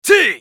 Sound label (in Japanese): 攻撃短; ナジブ